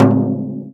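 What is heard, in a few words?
FRAME, INDIAN PERCUSSION; Big Fish Audio - Didgeridoo and Other Primitive Instruments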